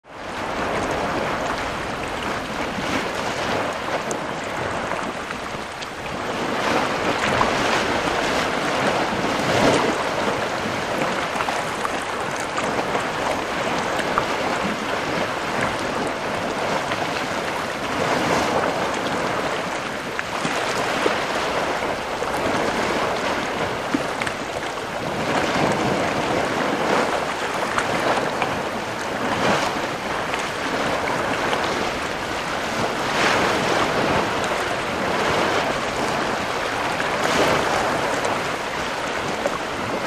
WATER NATURE RIVER: Heavy flow rushing through rock; rivers